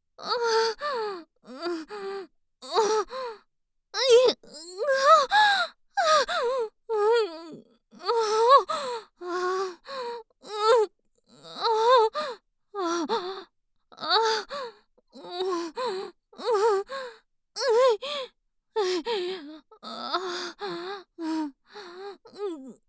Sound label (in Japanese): 喘ぎ声(中)